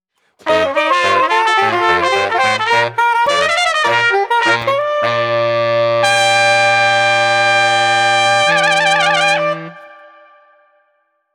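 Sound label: Street Brass New Orleans Transitions, 95 bpm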